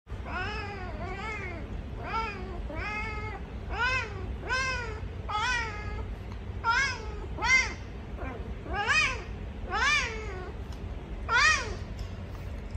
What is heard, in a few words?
serval atac